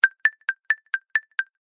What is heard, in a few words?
notification; samsung